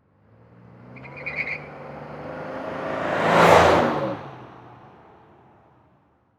Transportation Car Monte Carlo Drive By Fast With Squeal